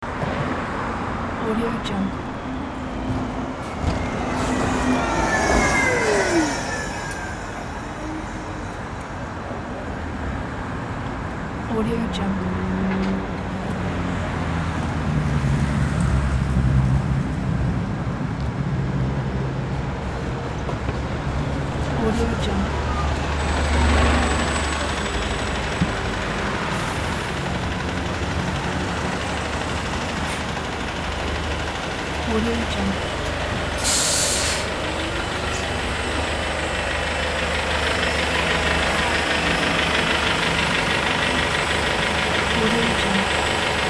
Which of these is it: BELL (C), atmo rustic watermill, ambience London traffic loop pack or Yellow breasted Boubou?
ambience London traffic loop pack